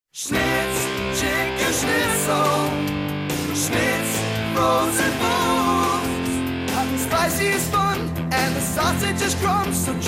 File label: jingle; SFF